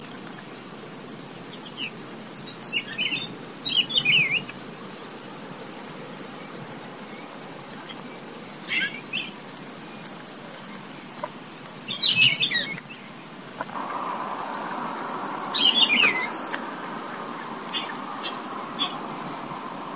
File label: Saltator maximus; Faz Country; Canavieiras; Silveira